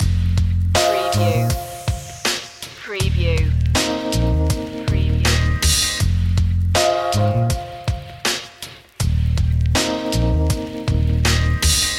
wavebreak loop

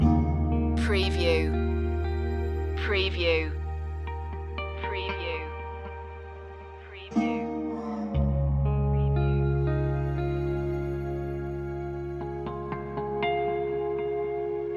prairie breeze loop

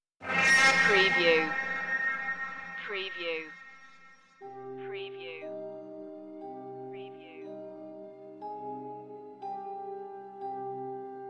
possessed trailer no risers or hits

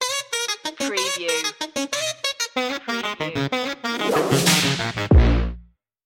bouncy whip stinger

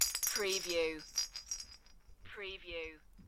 Christmas tree bell decoration; beanstalk audio

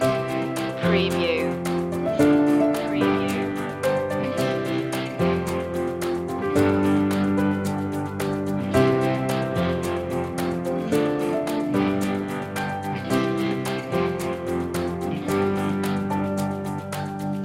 easy drive loop

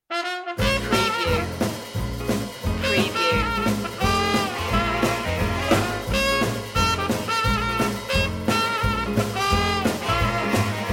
east river blues

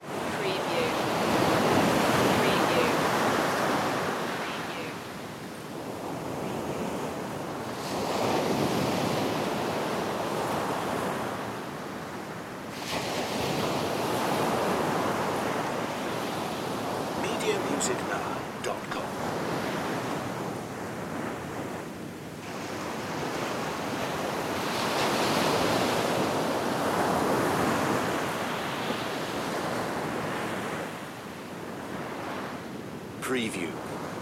ocean ambience

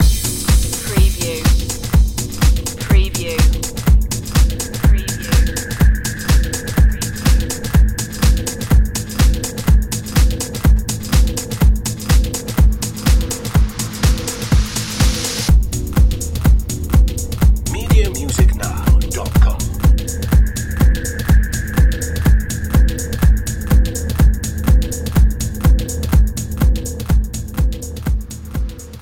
systemic beats

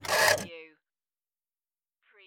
Pinball sound, motor or crane move

